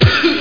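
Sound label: lemmings ship explosion; lemmingoids; fire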